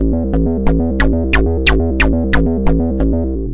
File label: 135 triplet synthbass